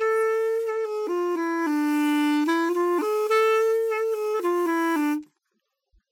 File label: Pungi; Tintin Reporter - Cigars of the Pharaoh